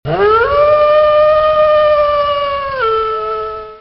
Loup, Animaux; bruitage

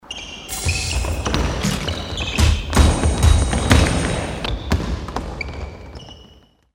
Basket, bruitage Sports

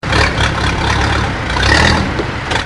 Tracteur

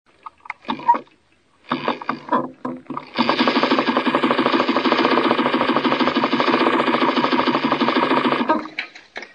Machine à coudre; bruitage; Electro-menager

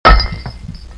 Caillou cassé; bruitage; Outillages

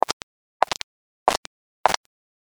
Pas masculin

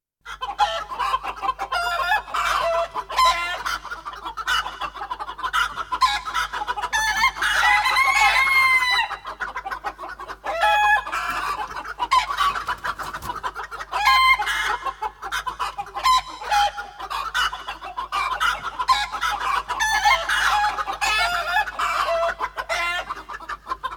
Les poules parlent, Animaux; bruitage

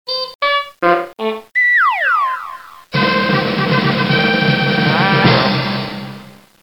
Orchestre, Cartoon; bruitage

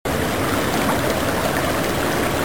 Rivière; bruitage; Liquides

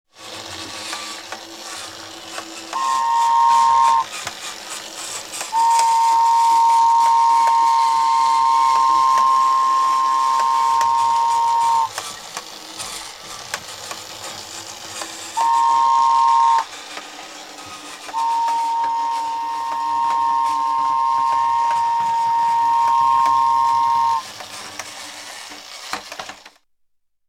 Train electrique

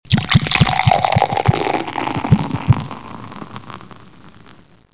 Biere, Liquides; bruitage

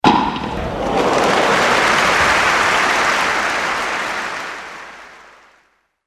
Point gagnant tennis

